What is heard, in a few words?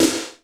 hard drums; 90 sSampleCDs; Crypton - DrumBase5000